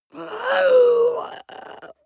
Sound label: witch inhale croak; kreaturen